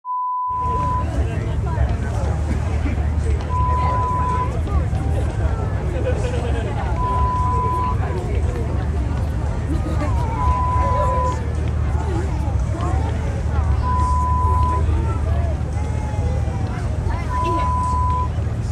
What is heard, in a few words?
Festival Ambience sound